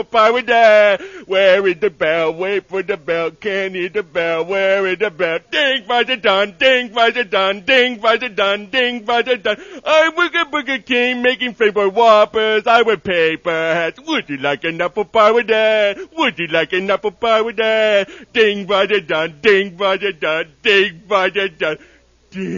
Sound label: Ding; Fries